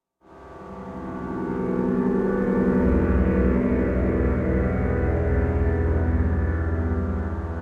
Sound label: Voyager Drone, Pad; Instruments; Ableton; Drone Lab